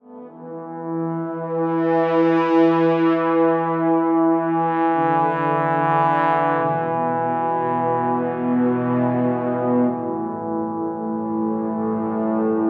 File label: Camp Brass